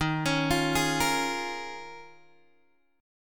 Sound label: Eb 9th Suspended 4th, Guitar Open G; x,8,11,10,9,8; down